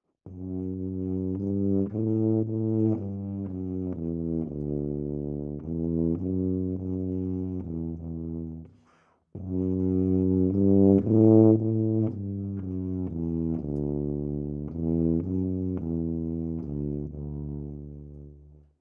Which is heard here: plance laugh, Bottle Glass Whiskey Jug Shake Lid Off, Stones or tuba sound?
tuba sound